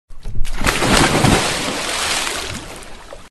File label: large water splash sound effect